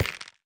下界木悬挂告示牌踩踏声

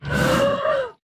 熊猫攻击型声

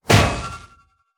重锤空中重击声

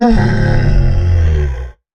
骆驼坐下声

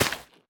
湿草 挖掘声

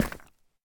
深板岩 踩踏声

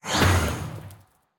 试炼刷怪笼生成声

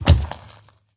僵尸疣猪兽脚步声

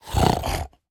猪灵蛮兵待机声

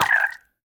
青蛙死亡声

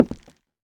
下界木踩踏声

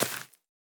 杜鹃破坏声

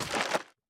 脚步声; 炽足兽

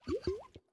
美西螈待机声

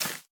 洞穴藤蔓破坏声; ambient

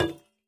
铜灯 放置声

